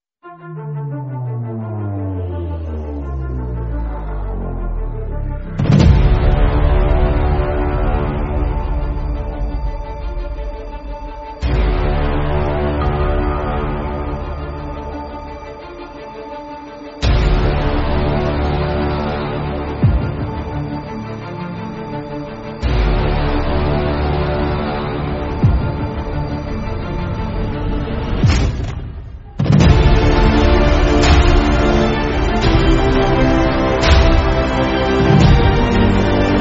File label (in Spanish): Musica Epica, Sin Copyright